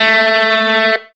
electric guitar

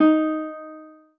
spanish guitar

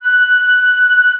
flute

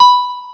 piano

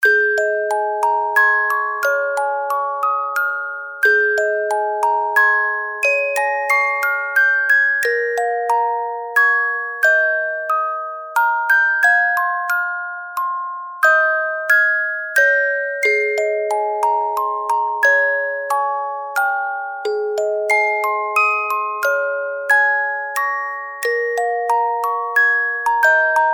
globe music

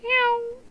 spooky, notes

